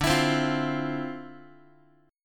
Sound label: D-Minor 9th, D, Guitar, 4A, 4E, 5A, 5D, 5G sharp, 6C sharp; x,10,7,10,9,x; Dm9 strummed